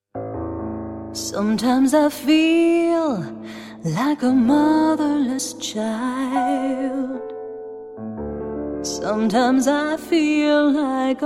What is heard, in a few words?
Singing